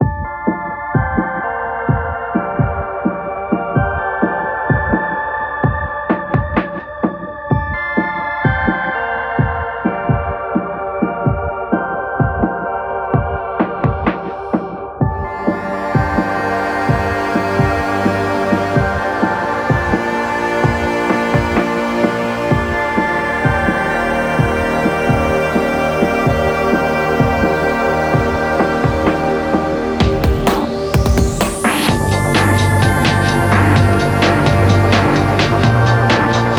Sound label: glockenschplock; weeklybeats-2016